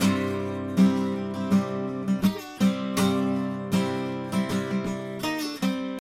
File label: guitar electric slide country music tone ringtone